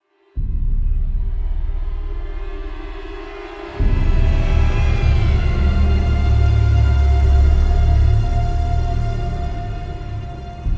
Suspense Theme

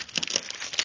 Drink loop

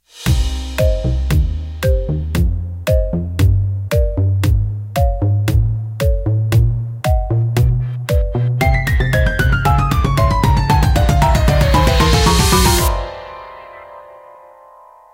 Quest Stinger; Club Penguin Island; Android, iOS, MacOS, Windows; StarFall

